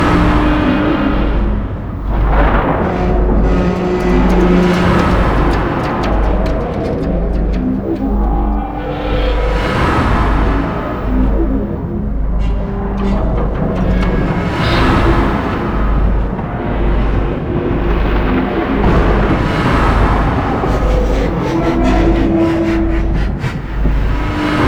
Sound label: Void Dragon Body Loop; Warframe; Duviri; Movement